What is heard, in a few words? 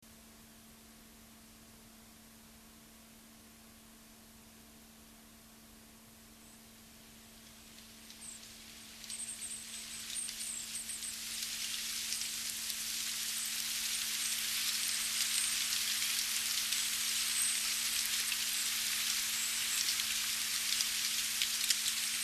Gentle Rain; Atmospheres